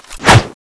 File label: stab, dagger; tfa cso; jay dagger